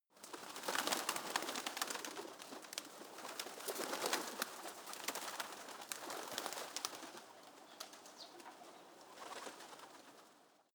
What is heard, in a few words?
pigeons flutter; natur-tiere; voegel